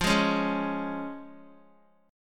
F Minor Sharp 5th F down Guitar; Fm#5; strummed; JGuitar

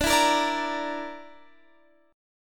D Suspended 2nd Sharp 5th D

